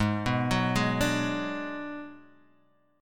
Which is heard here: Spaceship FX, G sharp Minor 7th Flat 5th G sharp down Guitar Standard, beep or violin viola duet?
G sharp Minor 7th Flat 5th G sharp down Guitar Standard